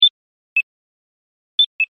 Pedestrian Button Beep, Pedestrian Crossing Button Press Beep-2 Takes; Traffic And Car Sound Effects